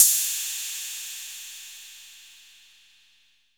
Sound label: Cymbal 808 Full; One Shots; Drums; Ableton Live 12 Lite; Core Library